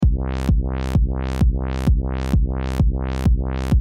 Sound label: Lfo; Hammor Global